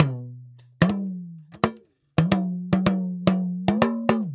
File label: Talking Drum, African